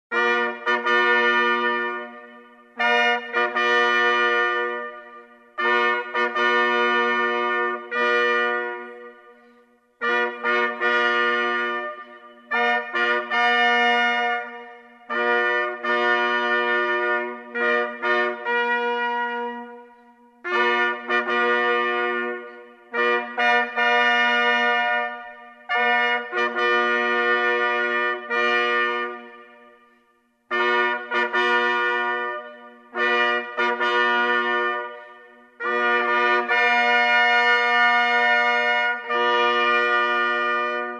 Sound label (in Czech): louceni, troubeni